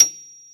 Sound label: PF, GRAND PIANO; AKAI S6000 CD-ROM - Volume 1